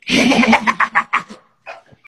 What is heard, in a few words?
cutie giggle